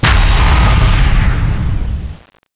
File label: soul pulse